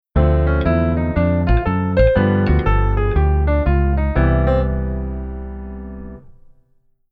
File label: Keyboard Voicing Ba